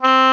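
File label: C4 hard straight, oboe; Northstar - New Gold VOL-2; EBONY OBOE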